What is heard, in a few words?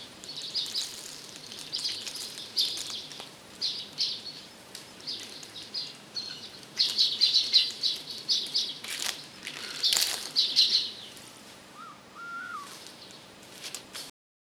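birds chirping soft rustling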